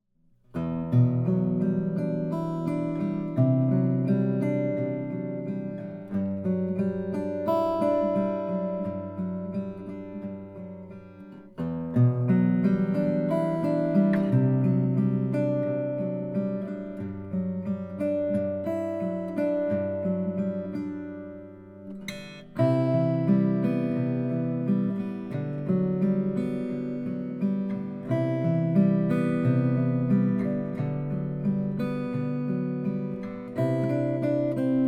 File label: elysian acoustics e12 honduran rosewood